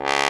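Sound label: Trombone, Orchestra Brass, Roland; Club-50 - Foundations Roland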